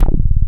low end bass; Masterbits; Dance Trax

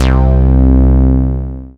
bass SY Bass Syn; Best Service Gigapack 2

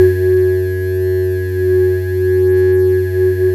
SYN KLACK, Microwave; Sound & Vision - Gigapack I CD 2

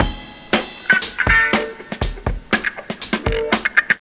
funky lp; Urban Shakedown

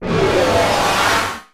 HYPER SPIT; ILIO; Trance Fusion

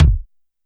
BD Synth; YAMAHA RM1X

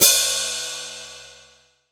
PS 2002 HH

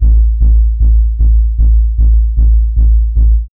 SYN FX; X-Static Goldmine 1

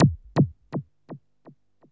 ECHO; AMG - Vince Clarke Lucky Bastard

